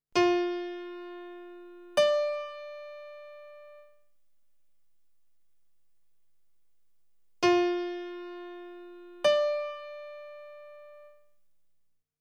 Intervalle Sexte

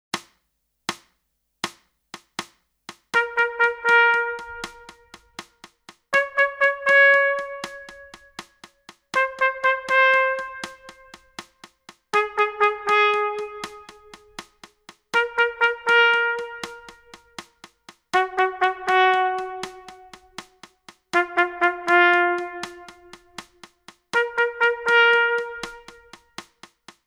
Echo Ubung

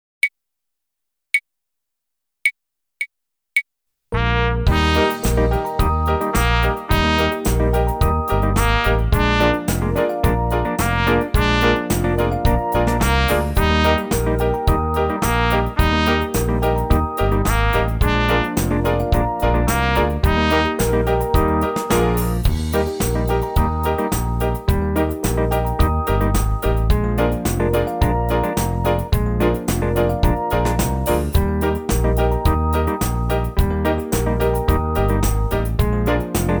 Speil Und Klatschduett

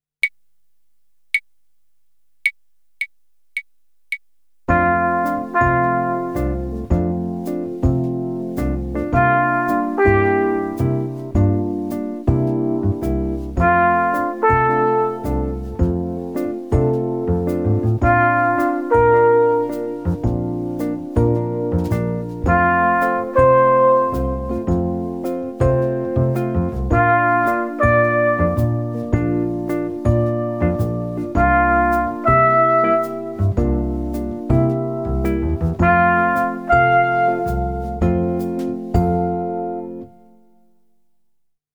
Echo Ubung

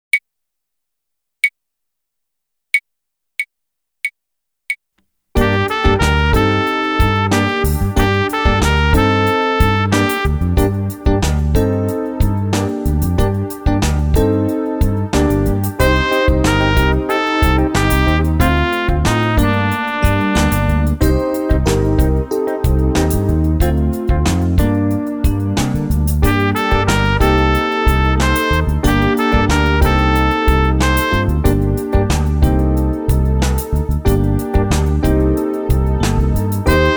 Echo Ubung

